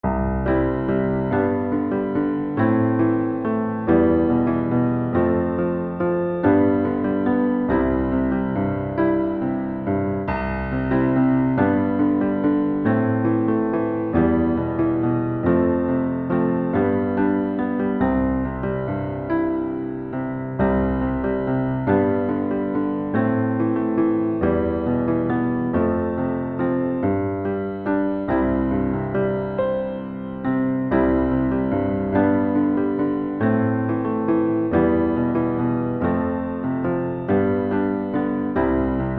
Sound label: Piano Begleitung